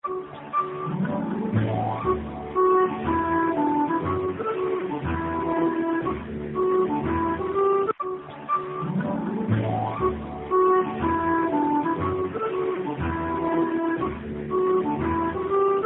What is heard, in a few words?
Flute; Icici